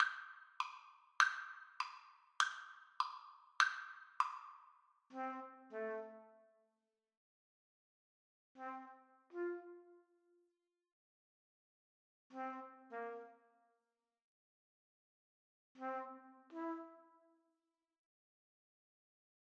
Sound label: trumpet duet